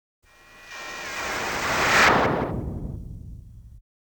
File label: Space Fantasy, Meteor Passes; BBC Sound Effects Library